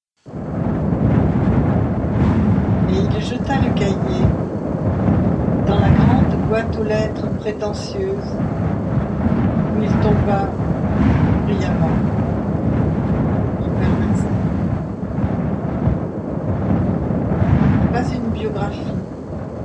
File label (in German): walzer